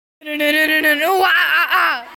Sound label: epic vocals